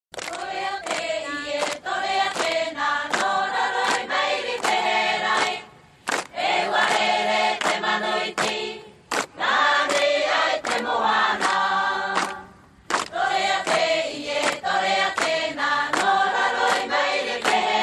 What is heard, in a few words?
TAHITIAN DRUMS